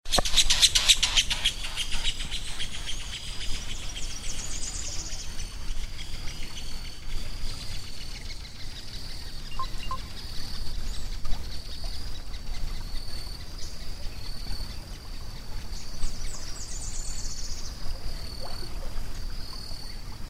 pair of green kingfishers chloroceryle americana flying low over river this morning